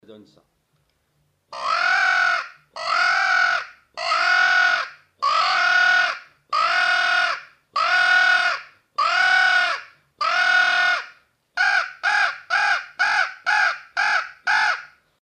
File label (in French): appel corbeau